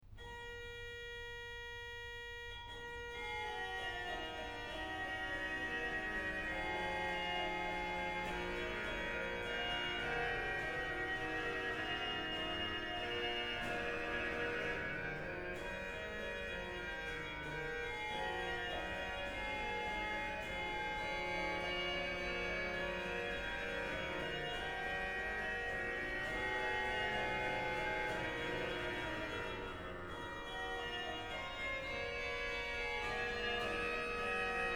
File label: ORGAN, Chorale Preludes; Vater unser im Himmelreich BWV 683; BACH; BACH ORGAN WORKS